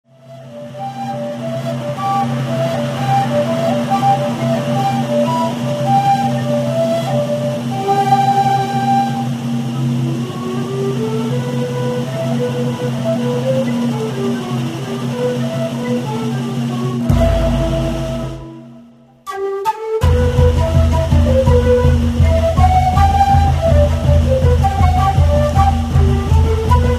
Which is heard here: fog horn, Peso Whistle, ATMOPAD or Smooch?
Peso Whistle